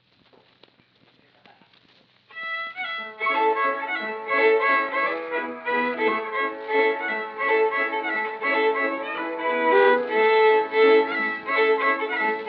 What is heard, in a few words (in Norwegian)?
MUSIKK; amerikanorsk; einar haugen